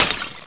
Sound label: fail; 100gamesandmore1995